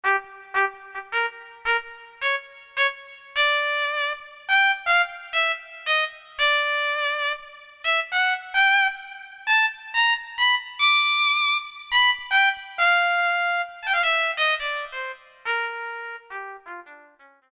TRUMPET, Korg DSS-1

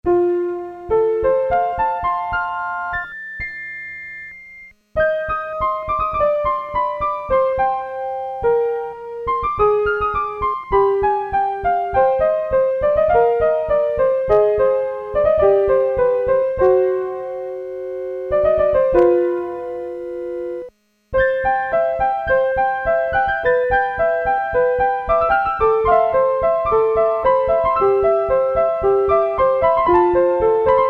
piano, Casio SK-1; Factory sound

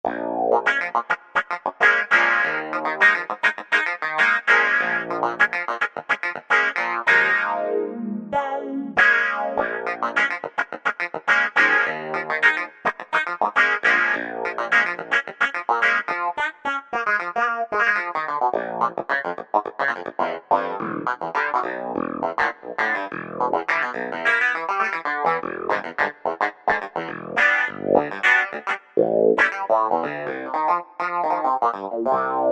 Wah Clav; Yamaha EX5R; Factory presets

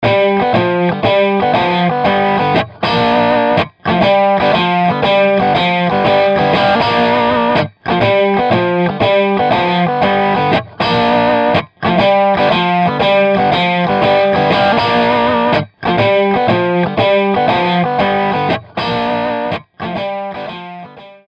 Texas Blues Guitar; Apple GarageBand 2; Apple Loops

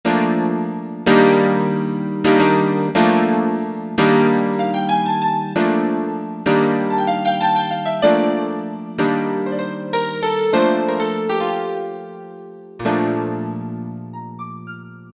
Piano Stage; Generalmusic Equinox

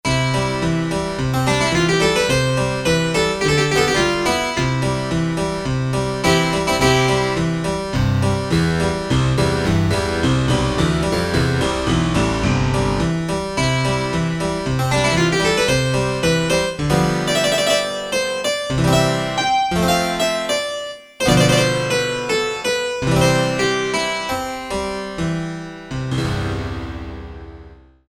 Harpsichord; Korg DSS-1 Sound Library

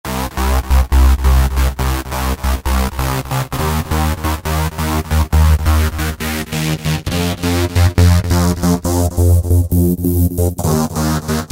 Unison HPF+LPF, Korg microKORG; Factory Voices

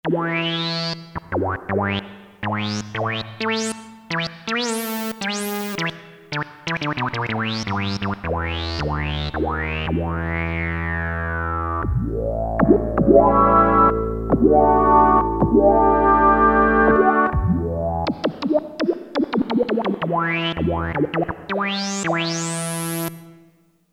JUICY FUNK, Roland Jupiter-8; Factory patches

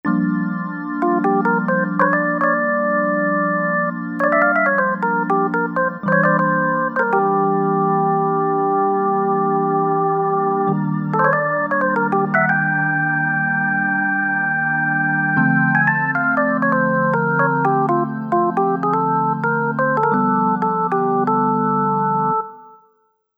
Dual Organ

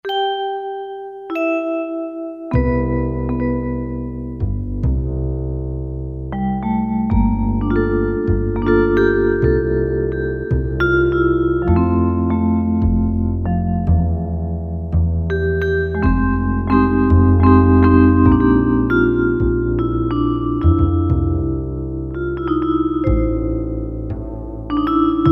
Bass & Vibes, Korg N364; Factory Combi demos

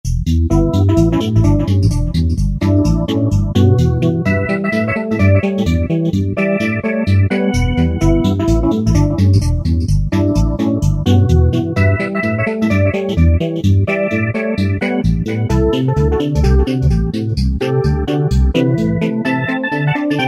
Roland V-Synth Late Lounge; Factory Patches

